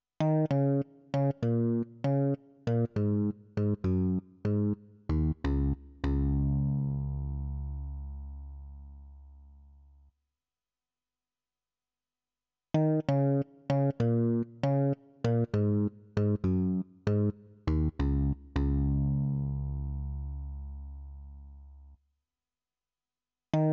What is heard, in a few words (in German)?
Digi Clavier